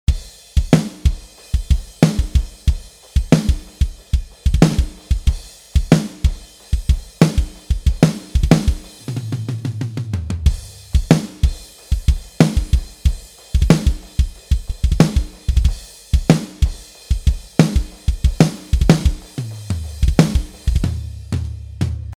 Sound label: drums samples